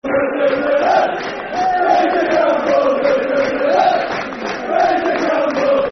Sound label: Football Chants (Soccer Songs); Fraizer Campbell; Hull City Hcfc